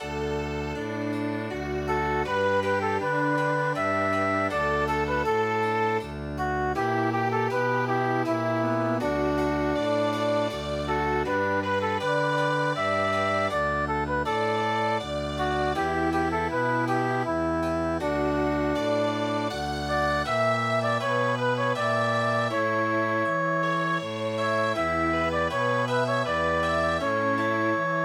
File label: Schlaf ein mein Blond-Engelein Chamber Music